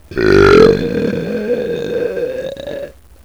BURP

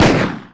magnum

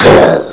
BLAM

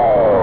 FILL; GAMESMITH; MOONROCKS

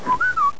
whistle

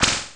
Stone Push; Future

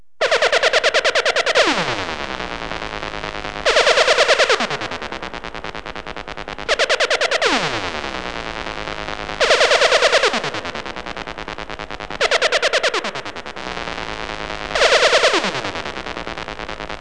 SCIFI

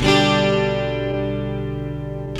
GUITAR, ROCK POP; MAGIX

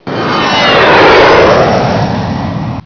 jet; robossy

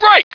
BREAK

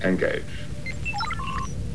load; Viper

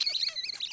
RAT; KRONDOR

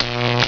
LAVA

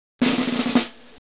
DRUMROLL

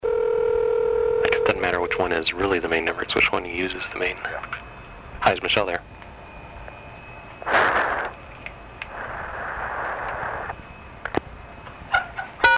Breathes Heavy (pissed) And Hangs Up; Prank Call; Random PLA Sound Clips

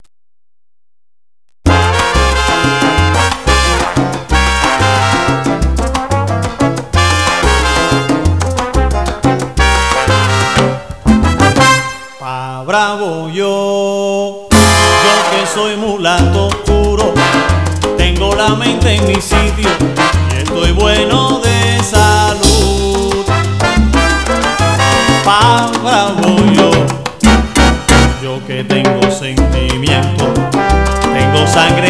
SALSA, MUSICAS

